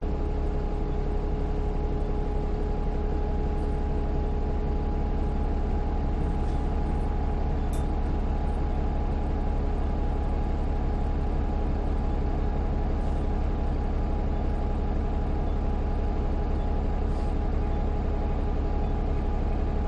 urban bus journey interior perspective newcastle upon tyne uk